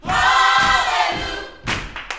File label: YOUTH CH PHRASE, Vocal Planet Gospel